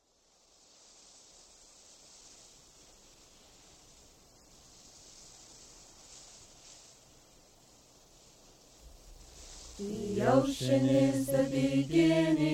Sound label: Chants